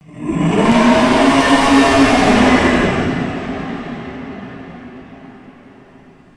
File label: Beast Roar